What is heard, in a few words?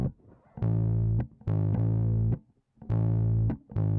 bass; musdb18